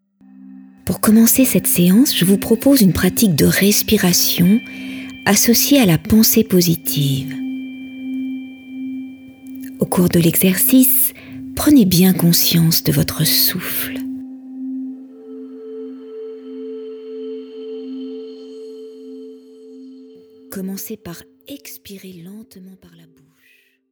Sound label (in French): respiration